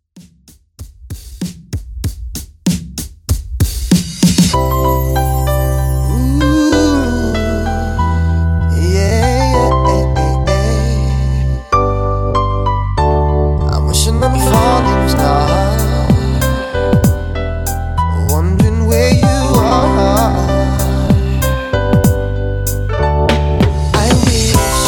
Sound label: 96 Bpm